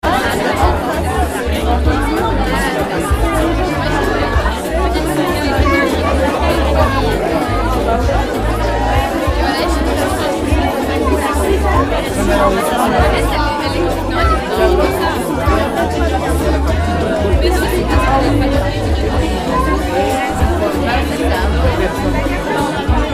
ambiance humaine avant concert

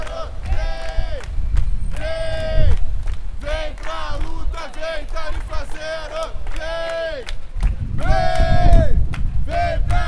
manif transport gratuit

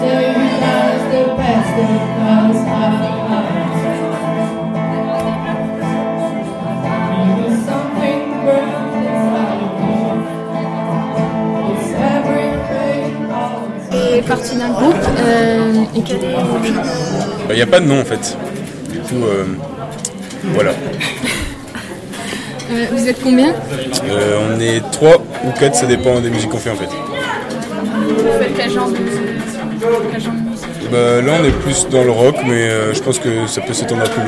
concert

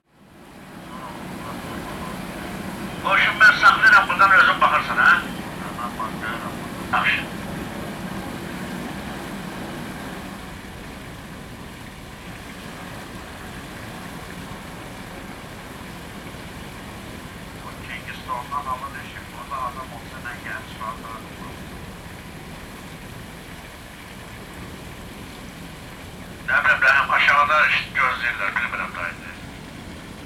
radio bateau azerbaidjan

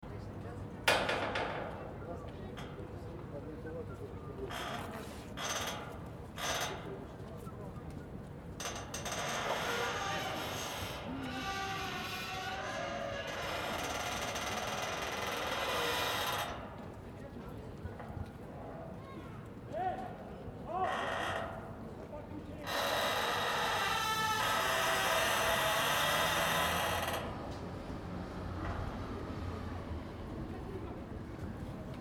peniche grincante

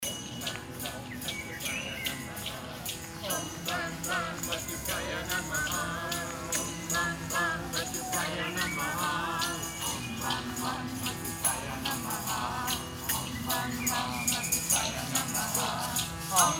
berlin parksong; nebulo

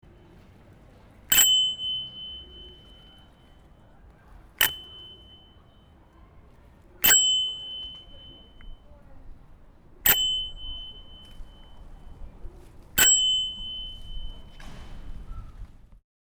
sonnette velib

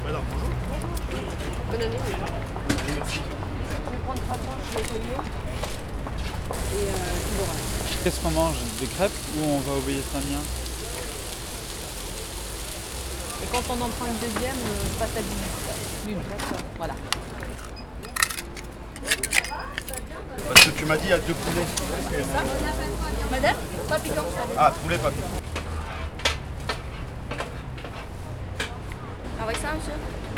ambiance marche

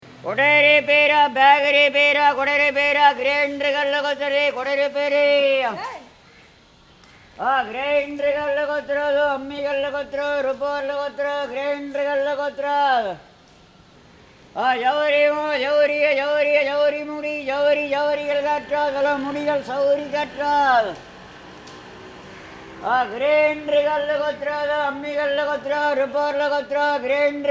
parapluie

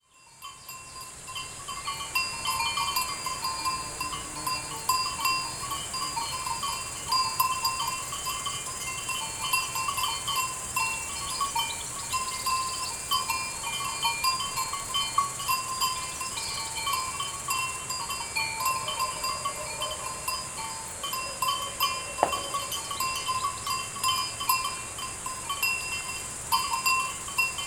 ambiance ete

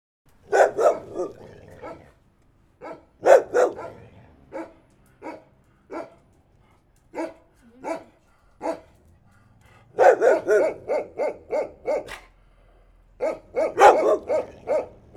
chien aboie

